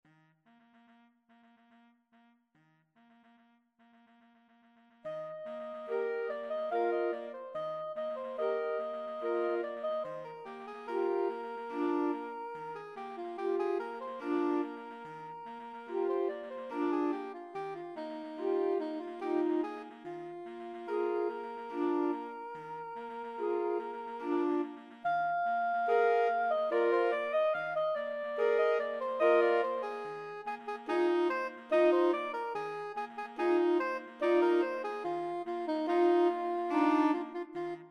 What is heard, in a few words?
bolero SAX Q